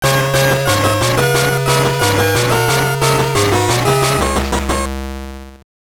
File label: Jingle, gb; Jeremy McGrath Supercross 2000; gbc